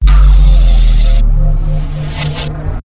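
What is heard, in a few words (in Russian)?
звуки эффектов пересечения машины времени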